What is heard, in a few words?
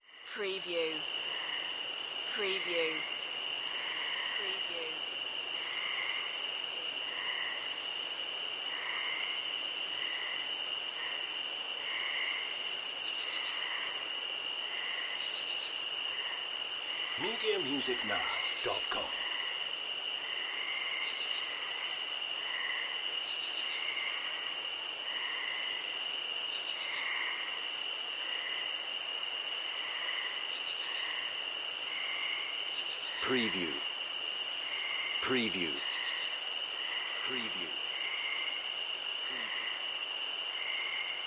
Forest Night Crickets